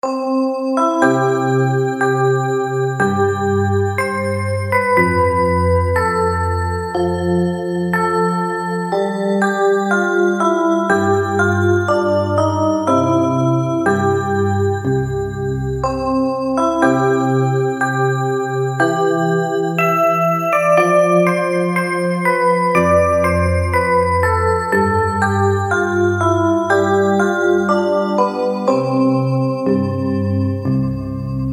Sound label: chime; kengeki